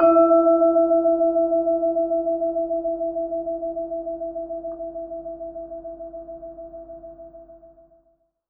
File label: vibes; Bells